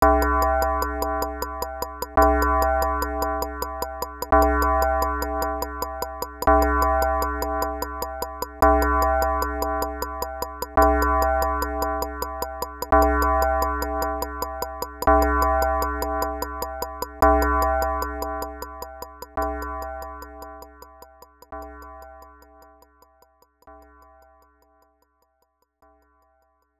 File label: Clock; The NewZealand Story; arcade